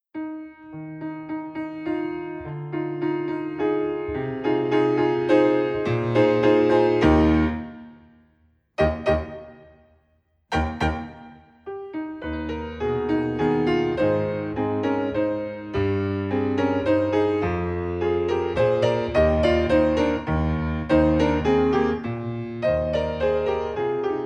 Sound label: Accompaniment Eb; instrumental solos